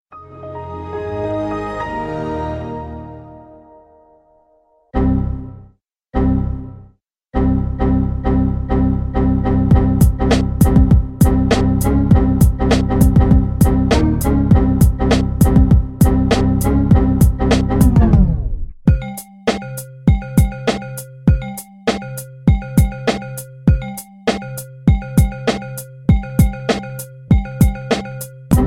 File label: Windows Remix